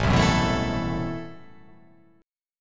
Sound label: A Minor 6th Add 9th A, Chord; Am6add9 strummed; JGuitar